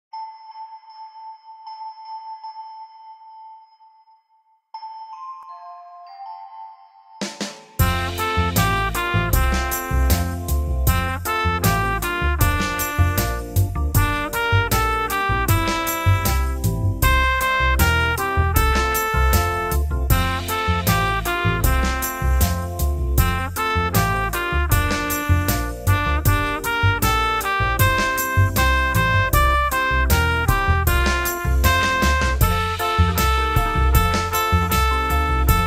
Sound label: jingle bells; magicworld